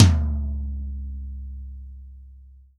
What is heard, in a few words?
TOM, Real Drum Kit, POP KIT